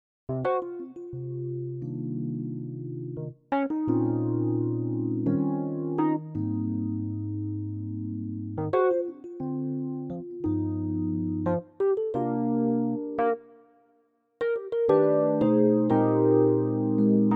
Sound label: rhodes